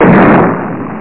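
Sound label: secondary Explosion; TacOps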